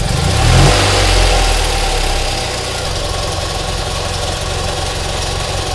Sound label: v8 rev short loop; commoncar